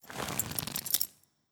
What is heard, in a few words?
Cloth; Mecano